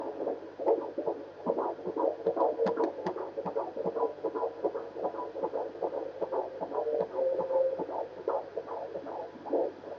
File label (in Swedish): pyret bpm 100